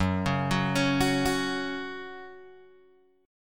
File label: Gb 5th Gb down Guitar Open G